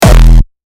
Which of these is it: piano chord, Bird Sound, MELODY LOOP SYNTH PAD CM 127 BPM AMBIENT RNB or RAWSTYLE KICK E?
RAWSTYLE KICK E